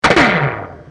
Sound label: laser